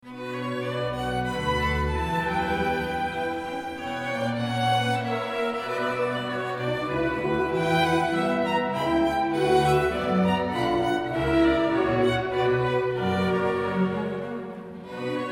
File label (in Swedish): Bach H-moll Svit Menuett; Dirigent: Per Svansbo